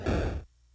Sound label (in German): wolfsatem zerstoert ziegel; gamesoundopedia